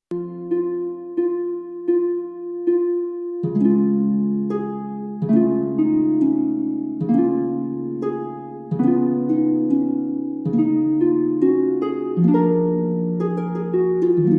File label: instrumental